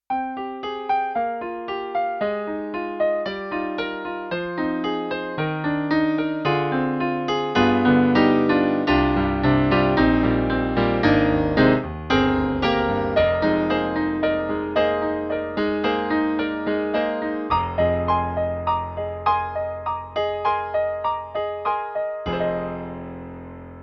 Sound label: Dark Grand; E-MU; PROFORMANCE1+